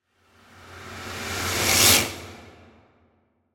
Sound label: Crescendo Fuzz, Production, Element, Crescendo, Fuzz, Swell, Whoosh; Crescendos